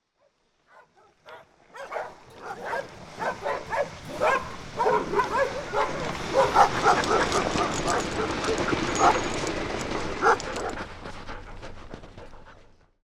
Dog Sled Entrance; operation-arctic

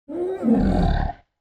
roar happy; keepers-kingdom

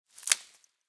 stick breaking; incredible-race

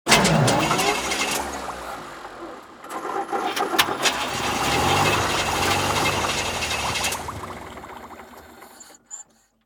Scuba Sub Dead Battery; ocean-commotion

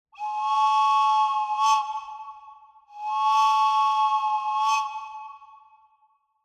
train whistle; wonder-junction

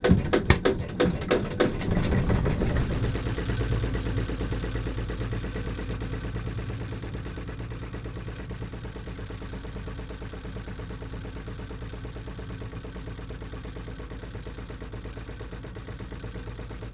boat engine; jungle-journey

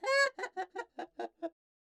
goose laugh; emerald-crossing

